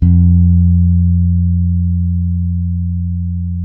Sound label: JAZZ F, bass; Spectrasonics; Bass Legends CD 1